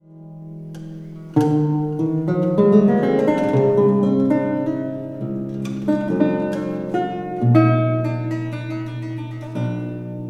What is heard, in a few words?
theorbo prelude